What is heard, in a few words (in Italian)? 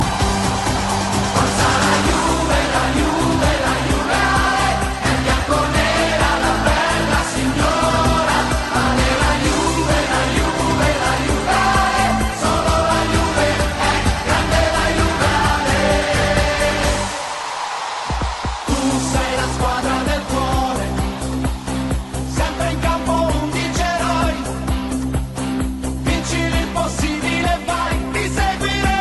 inno juventus